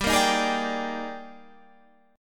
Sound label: D sharp Major 11th, G, guitar chord